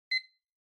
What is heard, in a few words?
Kitchen Timer Beep